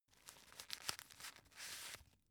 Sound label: tissue take soft